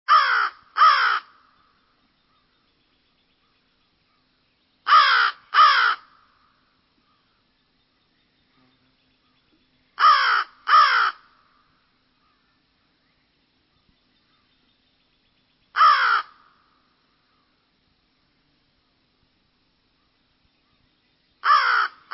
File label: American Crow, Birds